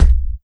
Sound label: step; doom3; archvile